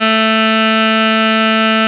LED, S-LEAD

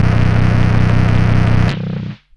Car Trash, Hard & Loud Techno; USB Soundscan vol.01; AKAI

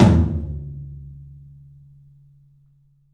3-D Percussives, LOW Toms

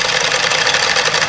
RATTLE, JAPANESE

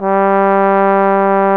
Tenor Bone; Solo Brass; Trombone; Tenor Bone 2

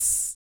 VOC PERC, Vocal Planet Jazz FX BASS PERC

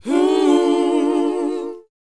WHOA C, Vocal, R&B & Dance; ILIO; Vocal Planet

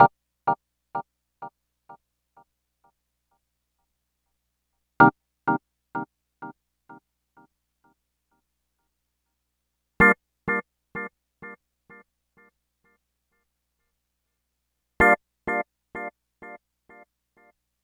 Organ Echos; Dr. Fink's Funk Factory

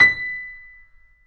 Mf B 6, Grand Piano off mic; AKAI S6000 CD-ROM - Volume 2

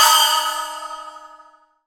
MIX3 SPLSH, MONO CRASH 1; MIX3SPLSHMPC

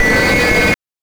BANK2 SFX, NES DPCM; MIDINES

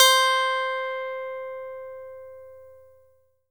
E-GITARRE, Alpine Volksmusik; Best Service

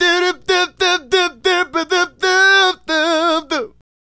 Male Solo, Hip-Hop and RnB Vocals

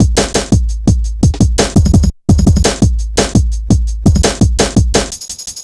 170, drum loops, drum & bass

